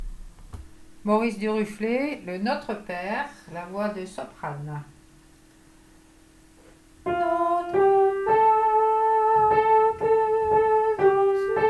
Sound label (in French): Notre Père; DURUFLE